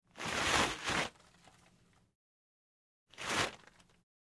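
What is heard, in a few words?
paper towel dispenser in airplane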